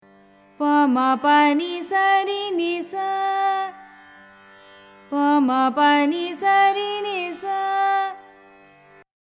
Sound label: revati, carnatic music